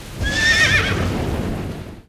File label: thunder neigh; Animal Sounds; Various Animals; Short calls